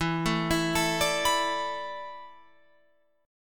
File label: E 7th Suspended 4th E down Guitar Open F; arpeggiated